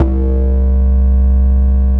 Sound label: BASS, Drum & Bass 160 BPM